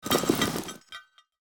Backpack metallic items shuffle; foley

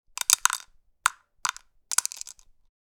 Pill container putting pills inside

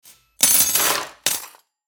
Kitchen drawer cutlery rattle

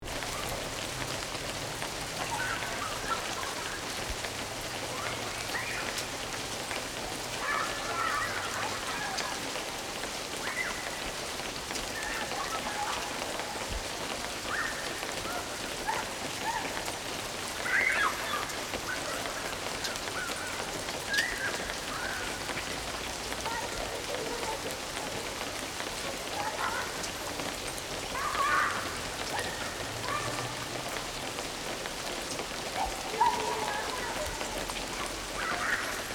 Nature park ambience rainy day and kids playing

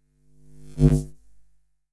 Multiple lamps and cables flowing energy whooshes

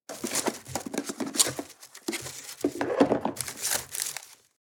Picnic wicker basket unpacking food

